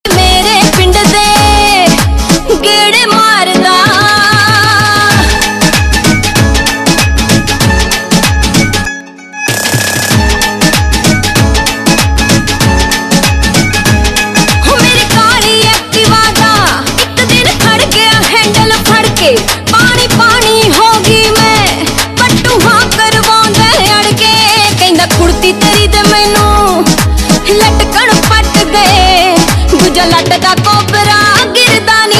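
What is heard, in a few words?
Ringtone, Punjabi Pop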